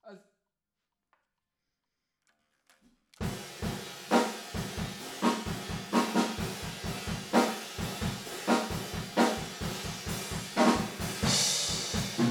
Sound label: TB GTR